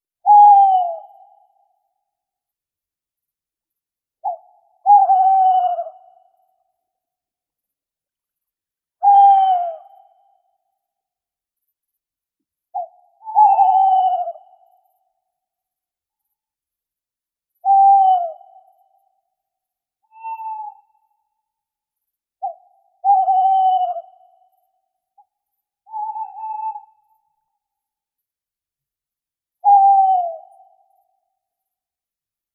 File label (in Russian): neyasit seraya strix aluco